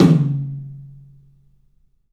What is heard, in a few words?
TOM, 3D Drums, CLUB TOM; 3D Sound